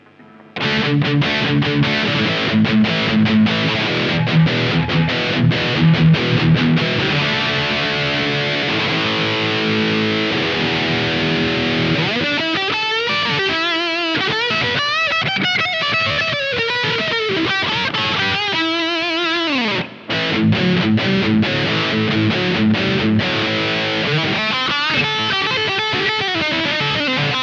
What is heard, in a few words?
Higain Preamp; preamplis en rack; Preamp 1